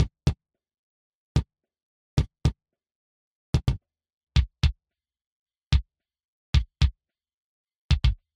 schlagzeug kick drum; schlagzeug abmischen

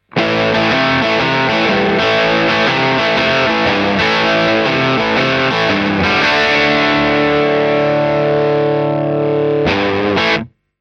sunset 212 voller poti celestion; THC Sunset Combo 212 Testbericht; V12

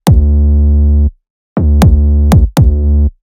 kick nach 808; Der ultimative 808 Producing Guide

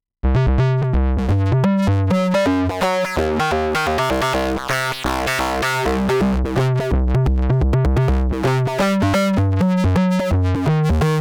filterfahrt, Novation Bass Station 2

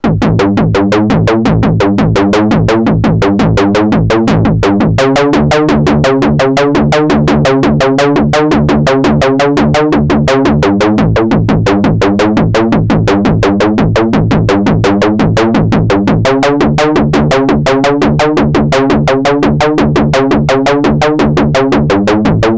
korg arp odyssey sync ring sequenz; korg collection arp odyssey test

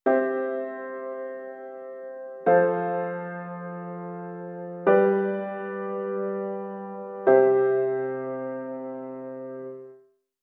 enge lage; songwriting

